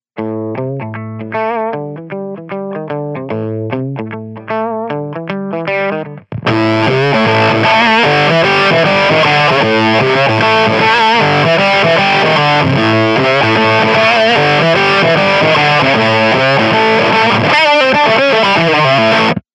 fuzzy, pedals

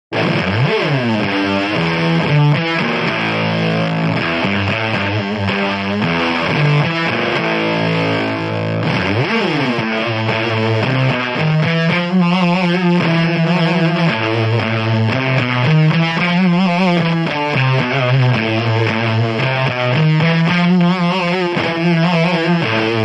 fuzzy dark heavy, pedals

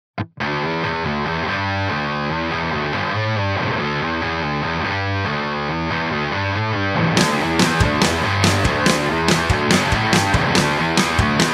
song, pedals

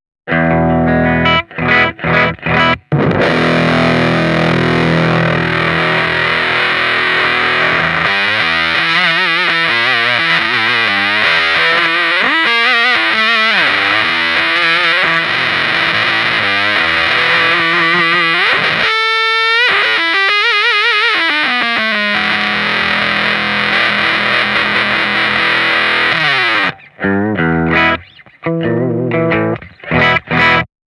position 1 dark bright, pedals

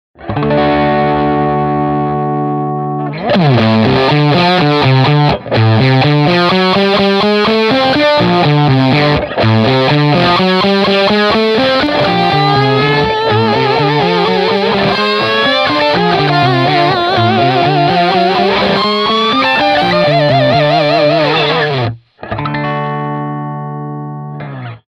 position2, pedals; stone boy

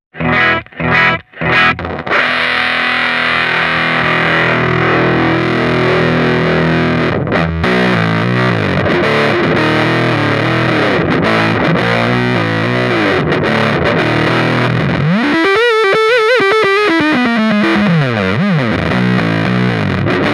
position1 bright dark, pedals

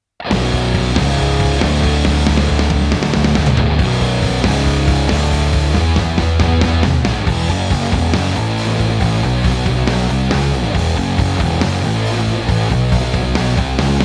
stoneboy rhythm, pedals

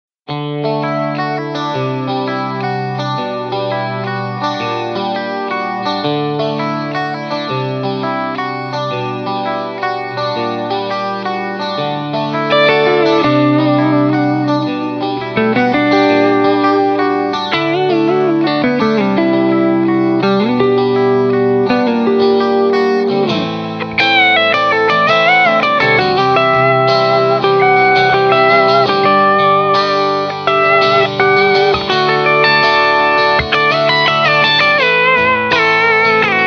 Coyote Clean; mojave

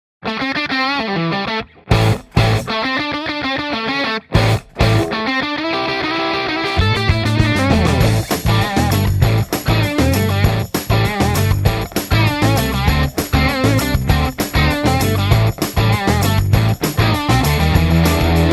Plexi45 - Song; mojave

